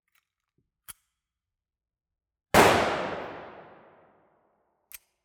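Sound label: cock and shoot 9mm rattle gun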